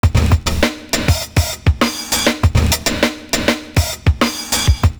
OLD GROOVY, LOOP; Univers Sons

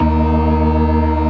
STOPS, Synth and Organ; InVision Interactive; Keith Emerson; The Most Dangerous Synth and Organ; ALL STOPS

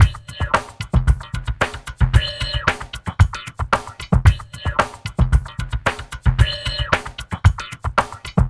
Liquid Grooves; Spectrasonics; 113 LIQUITEK

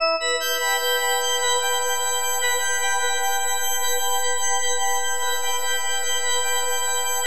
FM E MIN; Bolder Sounds

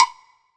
WBLOCK HI, Drum Mashines, SR-16 DRUMS; EdgeSounds

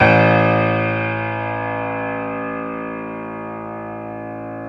PNO Grand Pno; Club-50 - Foundations Roland

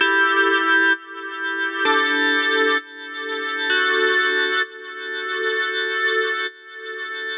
130 E Synth Dial Tone; Zero-G; Future Wave

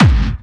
hard bass drumz

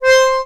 ACCORD C4, Accordions; USB Soundscan vol.40 - Complete Accordions; AKAI

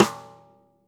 stickshot - snares on; JazzFunkKit

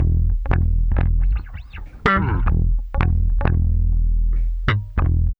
BASS, Funky Ass Loops; East West

